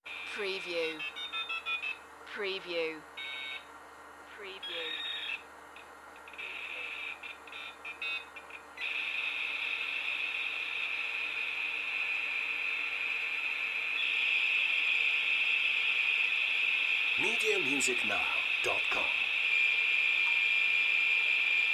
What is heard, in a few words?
Modem Dial Up